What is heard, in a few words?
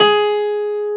PF G#2, GRAND PIANO; AKAI S6000 CD-ROM - Volume 1